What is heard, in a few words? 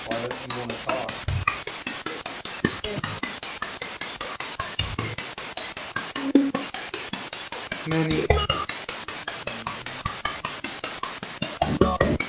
Train Station